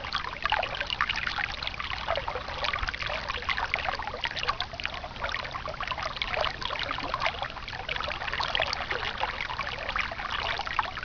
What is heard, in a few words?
waterstream; cstrike